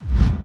BD Gate FX, Bassdrums; Vengeance Samples

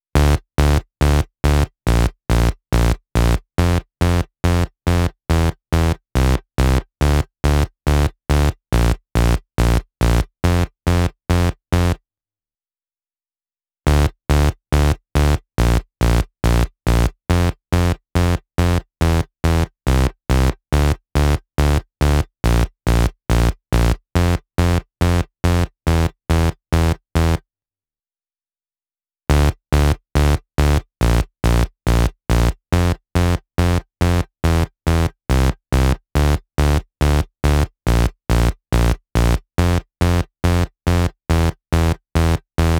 Bass, 140 BPM; Vengeance Samples; Song Kits; Take Me Away

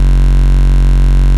Ultimate Bass Root F#; Vengeance Samples

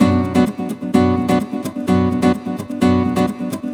Nylon Guitar - B min, 128BPM; Vengeance Samples; Special Sounds; Nylon Guitar Kit

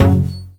Synth Sound; Vengeance Samples; VEE Synth Shots

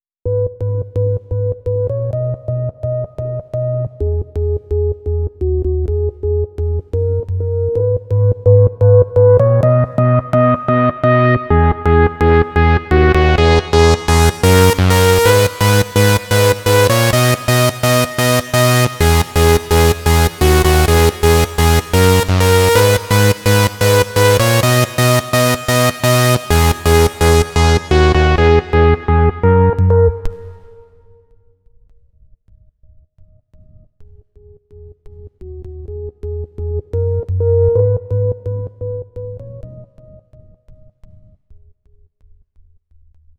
High Synth, 128 BPM; Vengeance Samples; VTDS2 Song Kits; Vocoder Mother Earth

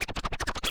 Cuts, Vinyl Record Samples; Cuts Medium

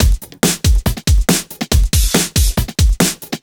Breakbeat 140 BPM

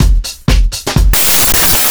drm 125, drumloops bassworx; PS-28 Vocal House Samples

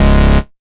Synth Cuts; Vengeance Samples; VES1 Instruments

